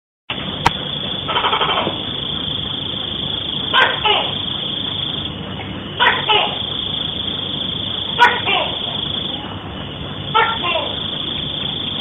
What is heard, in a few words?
Gecko